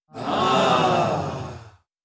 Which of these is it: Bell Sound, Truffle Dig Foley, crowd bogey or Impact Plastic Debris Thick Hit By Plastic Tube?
crowd bogey